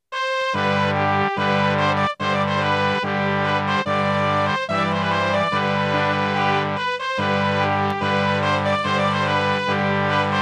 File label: bhutan anthem